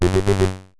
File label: climb; fargoal